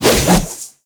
Dodge Whoosh; Warframe; Wraith

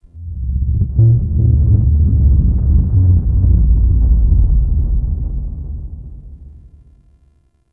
Deep Earth Rumble; Warframe; Tau

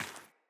Sandals Run Rock, Footsteps; Player

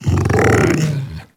Kubrow Tame Interact Sleep Rollover Groan A; Warframe

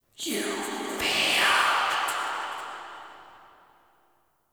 Cave Orokin Whispers; Ambience; Warframe; Stalker

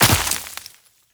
Land Veg, Footsteps; Warframe; Tenno

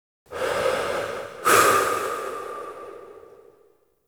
Tank Sniper Breath; Quincy; Warframe; NineteenNinetyNine; Stage12PartyOfALifetime

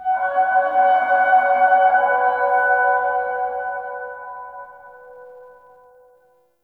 Cascading Fifths Flower Structure

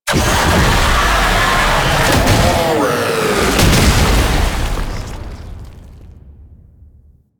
Death Cinematic; gameplay; Babau; Warframe; NineteenNinetyNine; Stage12PartyOfALifetime

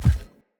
Corrupted Battle Dog Walk Step, Foley Footsteps; Animals

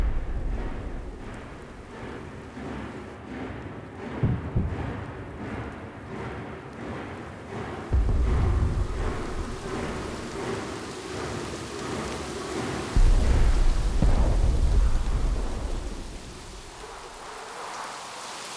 Ambience; Warsong Prologue

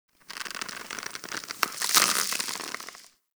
Tendon Stretch Large Close; Ambience; Warframe; Infested Eris

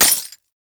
Fist Claw Coin Hit; Weapons; Warframe; Tenno; Incarnon

